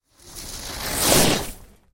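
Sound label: snowboard sounds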